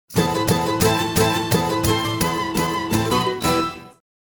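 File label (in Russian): короткая музыка счастливый счастливый конец; zvuk filma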